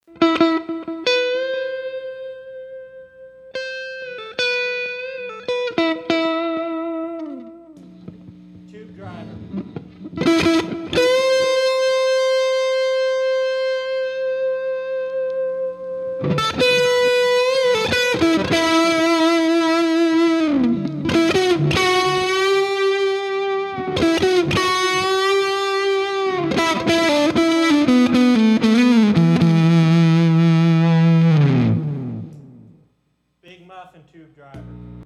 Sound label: TubeDriver V2 Muff Comparisons no comp; G2